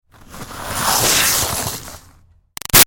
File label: Snow Slide By Icy Scrape Smooth; Skiing and Snowboarding; Sports; Big Room Sound